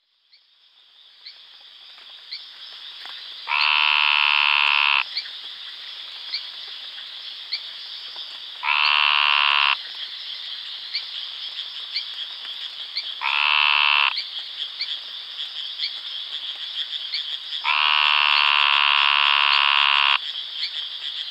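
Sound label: Scinax proboscideus, frog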